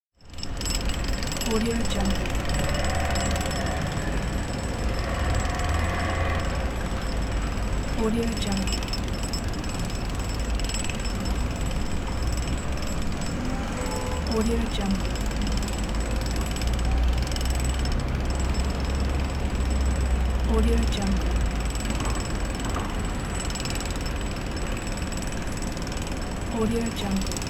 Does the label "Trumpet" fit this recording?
No